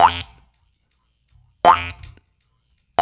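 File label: 80 bpm, Boing; Ben Boing; Spectrasonics - Supreme Beats - World Dance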